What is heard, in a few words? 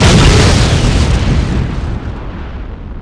Damage Reduction Explode